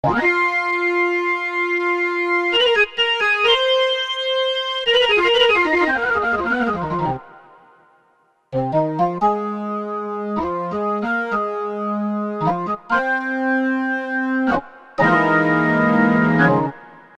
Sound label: org hammond, Alesis qsr synth